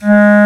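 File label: Bass Clar p F#2; Digital Sound Factory Virtuoso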